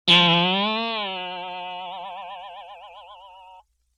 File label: Boing Box Higher; Vintage Cartoon; Hits & Tones